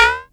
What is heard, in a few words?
SYNCUSSION